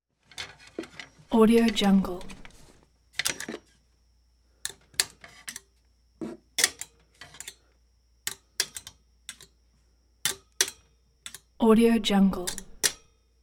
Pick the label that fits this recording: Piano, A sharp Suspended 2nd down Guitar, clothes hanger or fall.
clothes hanger